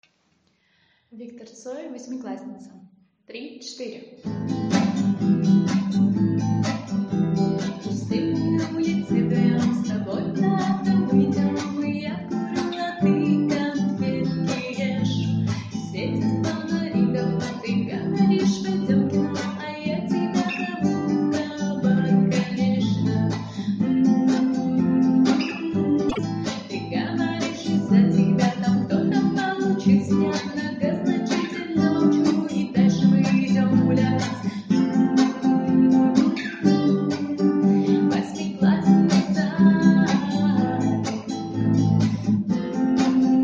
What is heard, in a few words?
acoustic